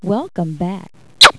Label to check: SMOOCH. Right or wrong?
Right